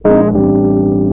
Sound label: Rhodes, Keyboards; Total Drum&Bass